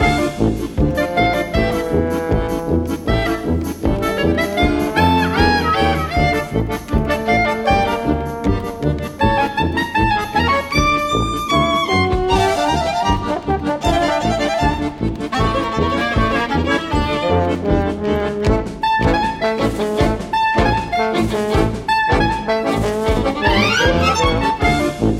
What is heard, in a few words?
Big Belly Deli (Win); Cooking Dash